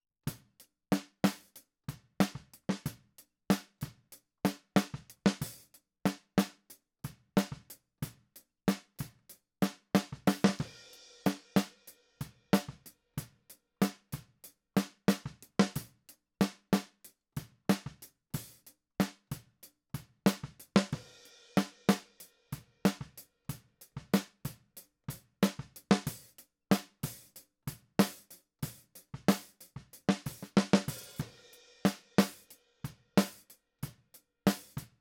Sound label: Snare